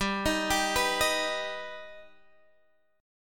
D sharp Augmented G

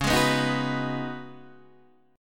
Db 9th Suspended 4th Db Guitar Open D

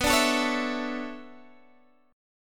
B Minor 11th B down, Bm11 chord; strummed

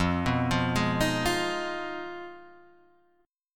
F Minor 7th Flat 5th F down Guitar Half Step Down

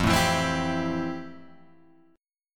F Major 9th F, Guitar, Open G

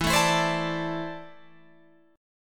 E 7th Suspended 4th Sharp 5th, Guitar, Open F; E7sus4#5; strummed

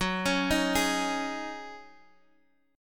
Ab 7th Suspended 4th Gb

